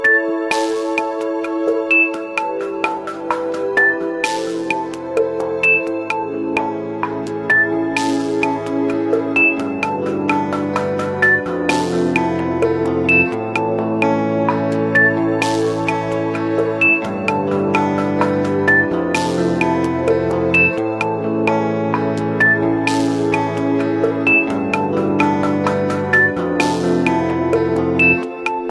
nokia remix ringtone; tiptoe

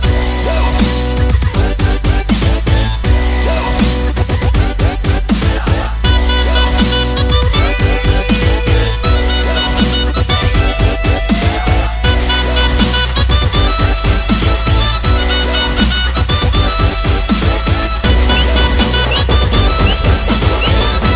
chill bro english music ringtone

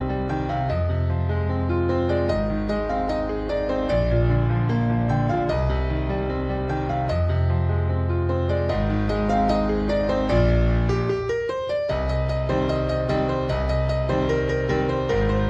piano bollywood ringtone

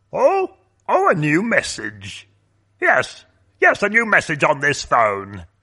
new message contact tone ringtone; sms ringtones

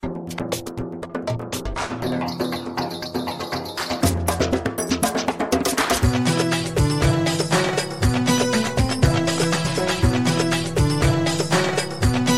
orient ringtone

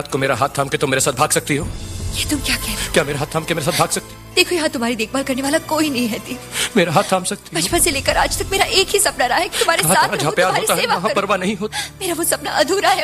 sms ringtone

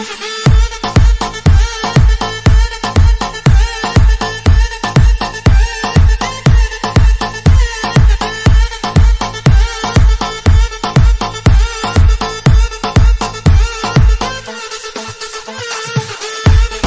dj remix bgm ringtone; english ringtones

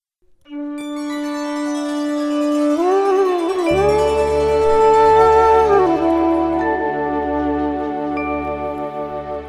morning flute ringtone; bollywood ringtones

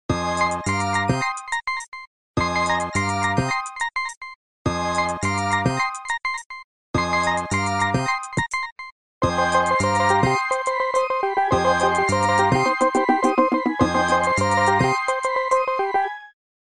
original motorola droid new ringtone

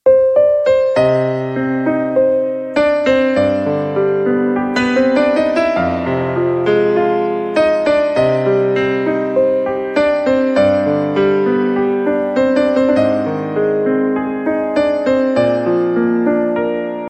space ringtone

